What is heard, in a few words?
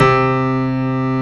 KLAVINS C3; East West - Global Sounds